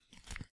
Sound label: Card Exit; Rebel Cops